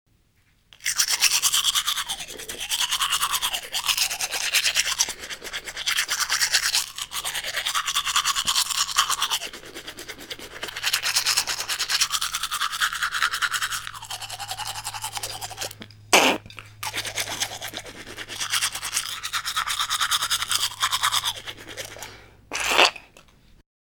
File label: Brushing Teeth